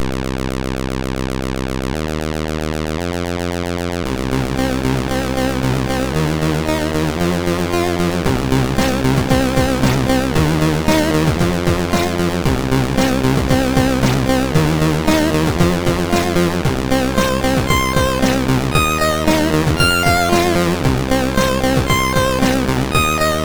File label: sid, MOS6581R2